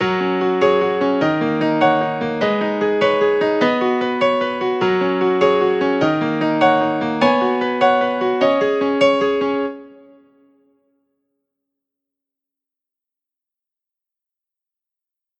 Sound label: The Scenic Piano